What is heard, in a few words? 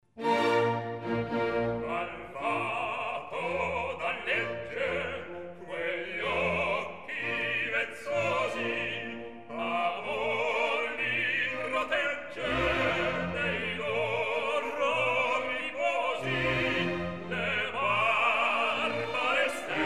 Duettino; Al fato dan legge; Mozart, Wolfang Amadeus; Cosi fan tutte